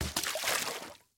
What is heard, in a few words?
Water Down